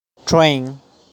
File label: Train, transport; Transporte